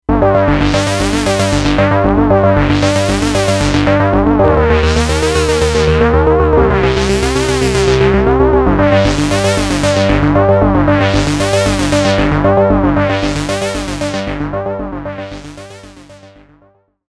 phasey

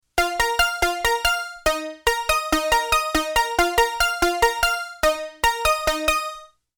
Juno6

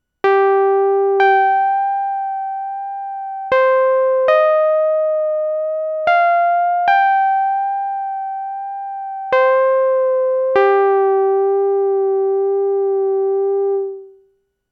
Juno6; AStoriedPast

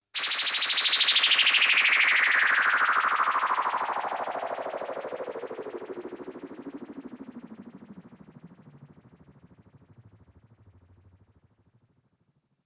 Swooshy microkorg

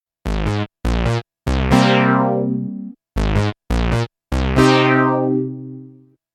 osc sync esq1

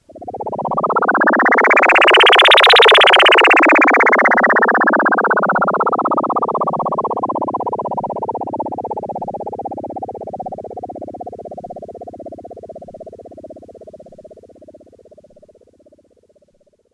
Juno6

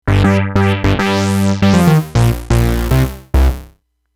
mean bass microkorg

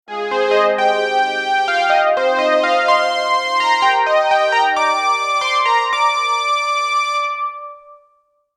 Stringey Lead, Juno6

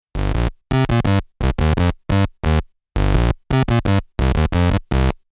Poly800

